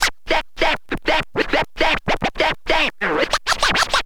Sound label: scratch short, Vinyl scratches